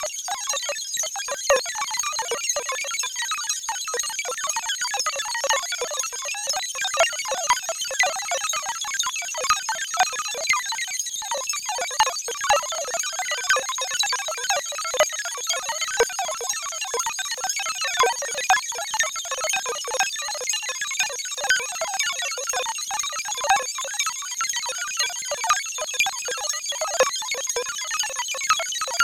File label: Scifi Computer